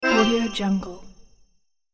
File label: buy item